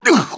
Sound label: damage; mohaa; dialogue